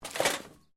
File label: METAL SCRAPE SMALL; Sound Effects:Materials